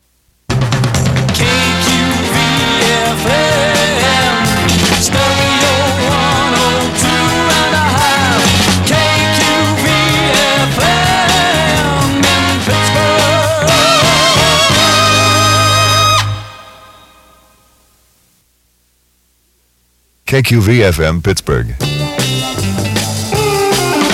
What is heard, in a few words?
Jingles; KQV FM